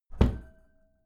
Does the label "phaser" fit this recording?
No